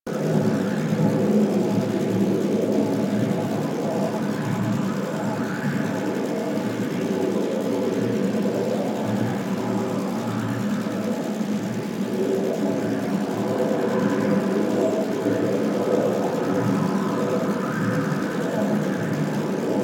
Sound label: Portal Loop; Missions; Zariman; Zariman Exterminate